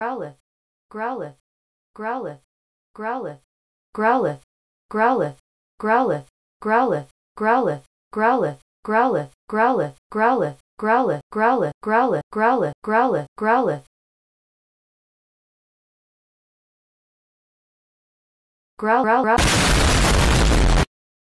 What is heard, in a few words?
explosion; growlithe